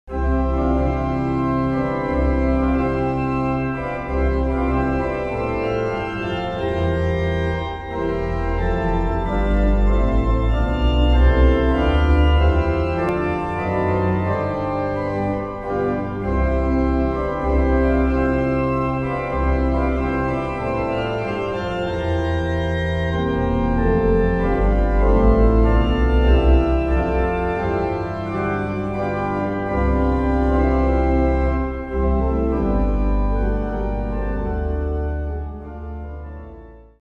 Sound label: organ